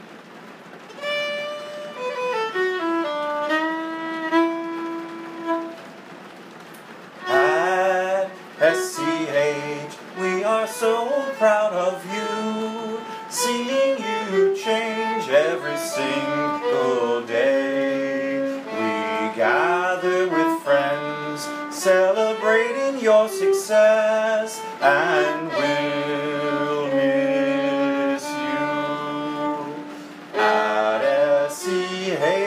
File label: Cheer